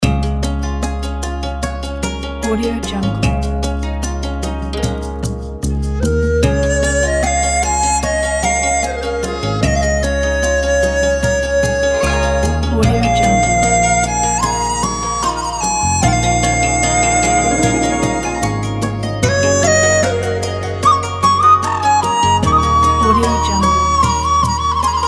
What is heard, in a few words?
traditional chinese flute loop